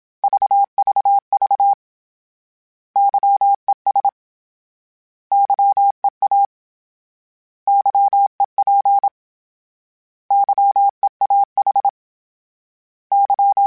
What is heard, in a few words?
Morse Code, CW Code Groups; HamRadio